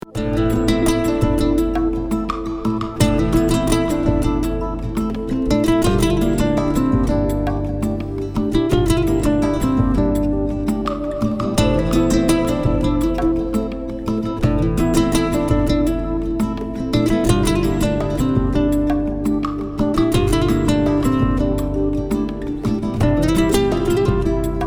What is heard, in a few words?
Flamenco Cale Fusion